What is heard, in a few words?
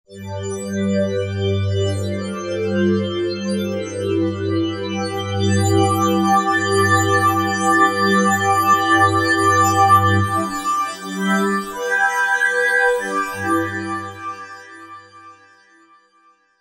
Additive Pad, Roland V-Synth; Factory Patches